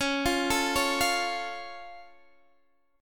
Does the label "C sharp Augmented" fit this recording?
Yes